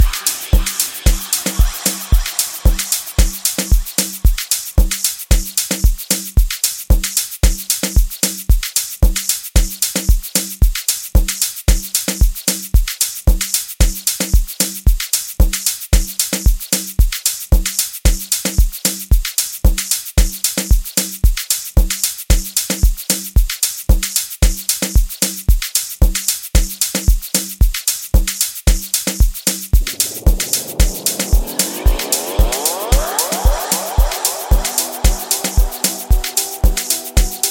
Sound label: Noise